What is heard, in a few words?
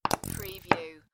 Squeezy Honey Bottle; beanstalk audio